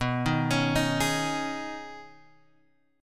B 6th Add 9th, down, Guitar, Open G

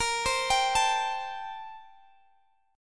A Minor 7th Bb; Am7/Bb; arpeggiated; chordshape

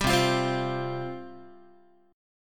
C Suspended 4th C Guitar; strummed; 10,8,8,10,9,x; JGuitar

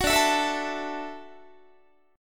D-Major Add 9th D down Guitar; strummed; JGuitar

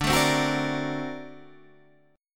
D 7th Flat 13th D, chord; D7b13 strummed; JGuitar

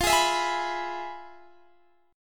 F Minor Major 7th Flat 5th

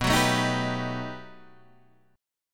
B 7th Flat 13th B, guitar chord

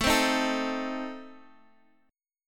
A Diminished A Guitar Half Step Down, chord

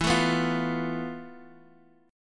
F Major 7th Flat 5th E, guitar chord

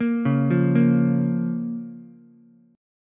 F Suspended 4th Sharp 5th, down, Ukulele; arpeggiated; JGuitar

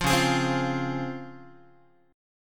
Db Major 7th Db down Guitar; strummed; JGuitar

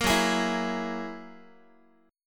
F sharp 9th F sharp Guitar; F#9 strummed

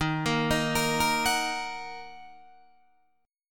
Eb Minor, Guitar, Open D

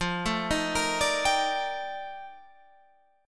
F 13th F, chord

